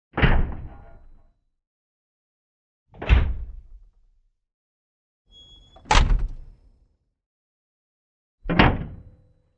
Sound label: metal door closes